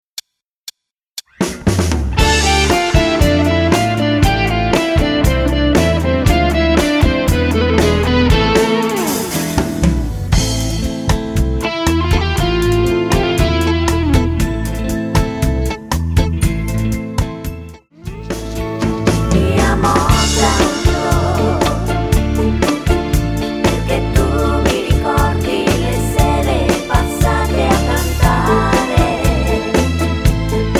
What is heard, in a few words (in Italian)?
suona chitarra cori; beguine; male instrum